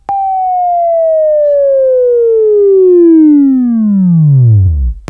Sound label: Buzz, Right